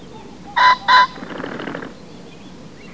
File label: Phasianus colchicus